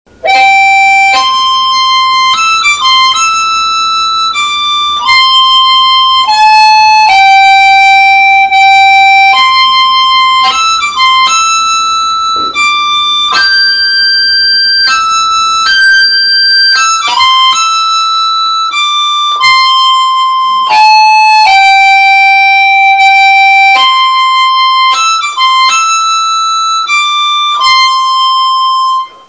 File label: amazing grace upper, harmonica